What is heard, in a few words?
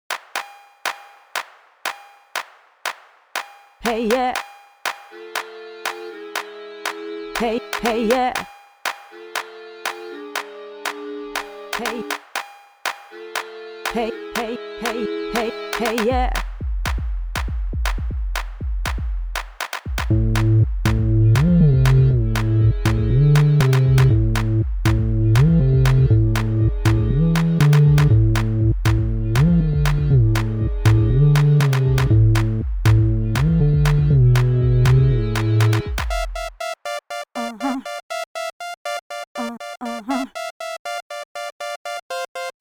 MIDI ditty